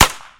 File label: gun